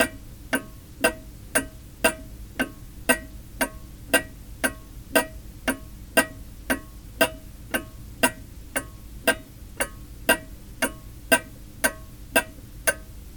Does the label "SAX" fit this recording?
No